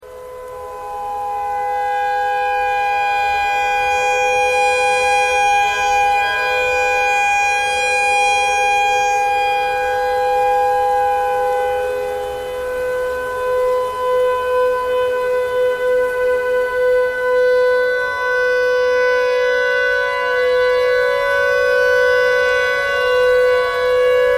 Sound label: clarinet notes e tornado siren thread noise